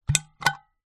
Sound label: household thermos flask lid button push down close